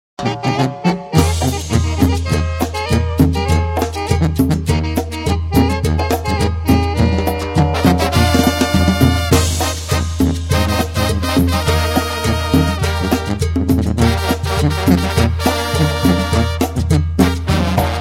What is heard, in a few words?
tamborazo; Latin